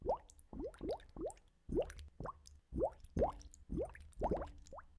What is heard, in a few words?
cooking